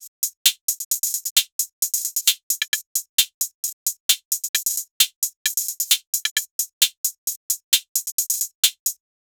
uk garage full drum loop, 132 bpm

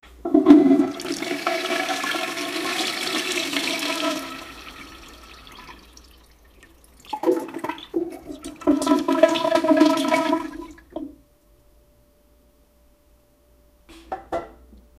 a girl diving down the, bathroom

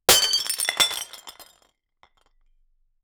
falling from hight on a, breakage

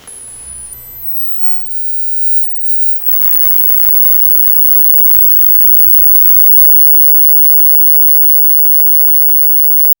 sound effect of an old television

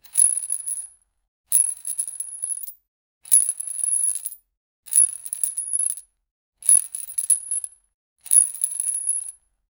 metal chain kreak kreak

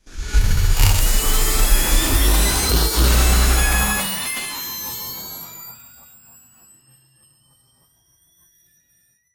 robot mechanic jump

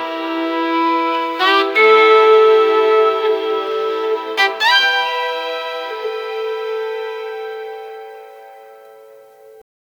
slow nostalgic instrument

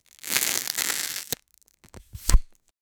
hand opens cigarette pack, crinkle

